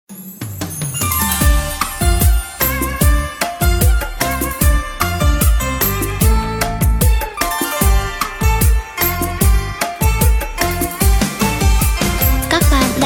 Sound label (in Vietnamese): beat